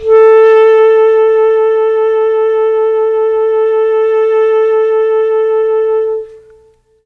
A3 clarinet, mellotron; CHM CLARINET

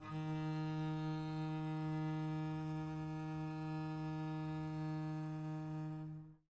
eb3 down, bass arco; meatbass

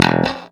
e2 staccato, glowly bass

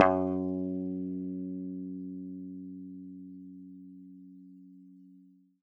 gb3 fff, swagbass

